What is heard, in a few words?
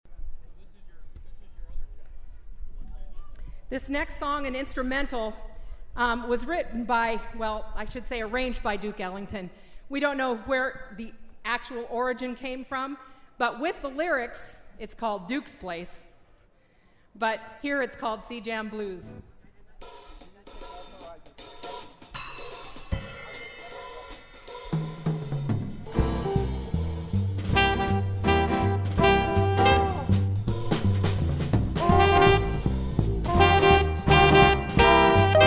Jam Blues